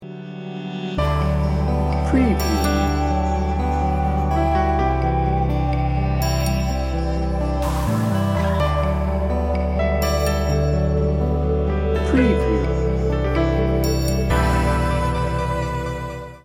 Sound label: dream state